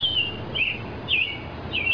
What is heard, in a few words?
robin, birds